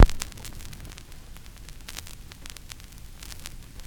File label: CRCK, Production Effects